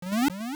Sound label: Swim; Zelda; Link